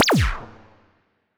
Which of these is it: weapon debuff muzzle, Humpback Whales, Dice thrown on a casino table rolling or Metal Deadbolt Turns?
weapon debuff muzzle